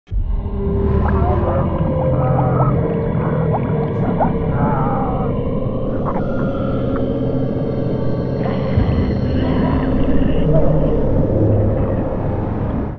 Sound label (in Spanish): bloop sonido; el misterioso bloop